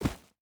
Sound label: footstep barefoot dirt jump land; Rust